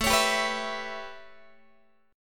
A 9th, down, Guitar; strummed; JGuitar

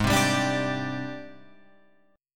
G sharp Major 7th Flat 5th Guitar Open F; 8,7,7,9,10,9; JGuitar

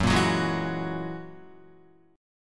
Gb 9th Gb down Guitar 4E,4A,5D,5G,5C,6F; strummed; JGuitar

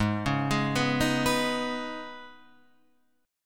Eb 7th Suspended 4th Sharp 5th Ab

